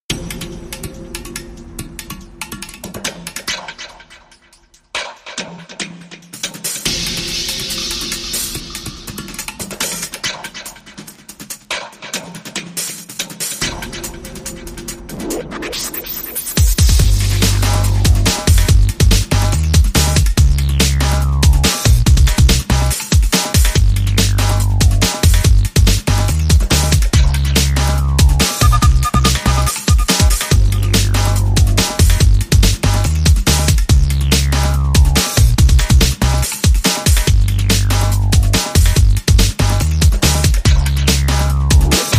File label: Arabic Flavour Rhythm